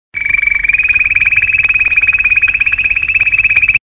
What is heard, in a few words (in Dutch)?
belrol waterslager malinois